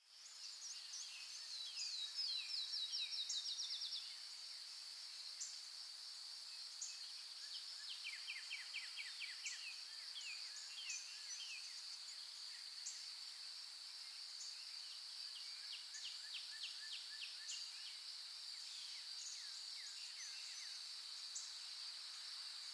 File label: Ambience Forest Morning Birds Insects; Forests and Jungles